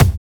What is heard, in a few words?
Tom D; samples